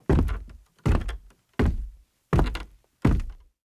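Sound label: wooden stair footsteps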